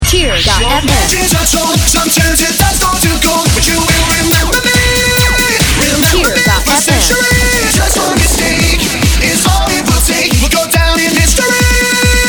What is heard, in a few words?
with loop